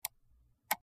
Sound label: Highpitched Mouse, mouse